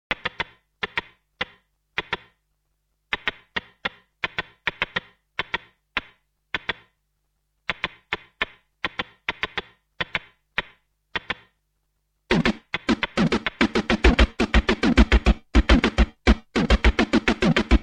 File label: Drum Major